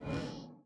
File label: Tile Warning; unsighted; enemies